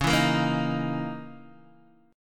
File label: Db Minor 6th Add 9th Db down Guitar DADGAD